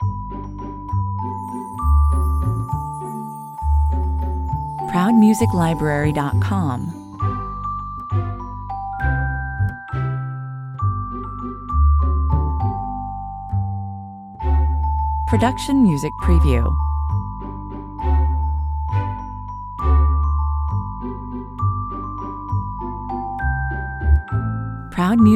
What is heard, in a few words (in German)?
suesser die glocken 100 bpm